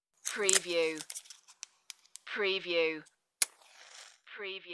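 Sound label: Match Book Flame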